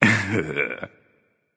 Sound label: heh heh; smite; voicepack; ravana